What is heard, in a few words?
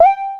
hover